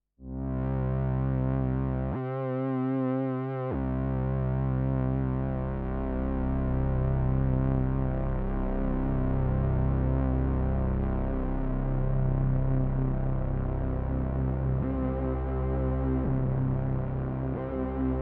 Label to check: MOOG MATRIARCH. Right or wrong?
Right